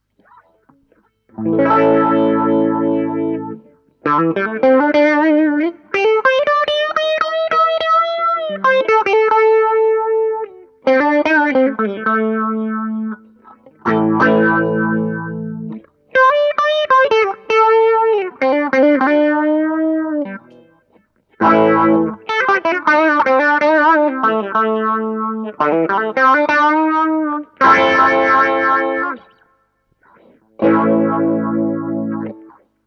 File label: phaser test